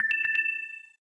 Low Battery

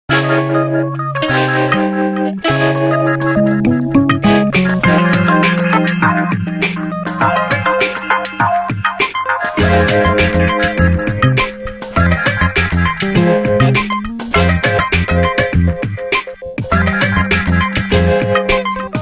Groove, ringtones

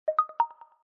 Pop

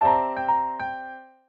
swingdown start pianoblues; LG Wing 5G SKT

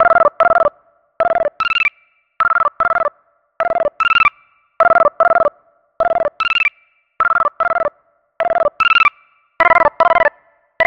Beeper; Sony Ericsson; J10 Elm Prototype; ringtones

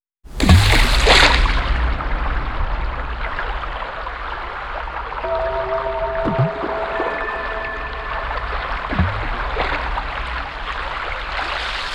UnderWater II wo drums; Sony Ericsson; C901; PlusFourSix; Lars Edvall

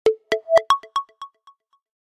Pop, phonetones notifications

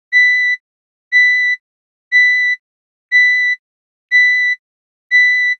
Alarm Electronic; BlackBerry OS-4.6

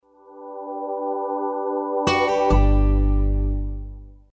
Power down; Motorola RAZR V3i Cingular

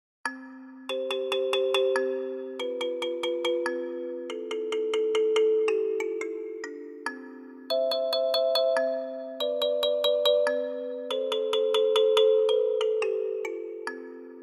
Drop

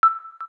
KEY XYLOPHONE STAR, keytone; Samsung SGH-T369 Linx

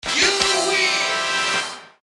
dice; GS390 Prime

